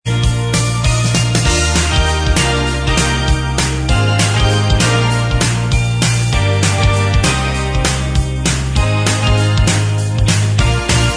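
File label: instrumental, backing track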